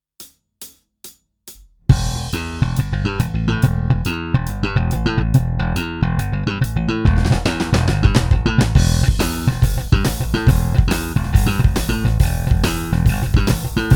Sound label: Jazz